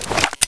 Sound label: p228 slide back, weapons; cstrike